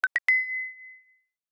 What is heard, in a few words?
Alert Activity Goal Attained Haptic; i OS; iOS10; nano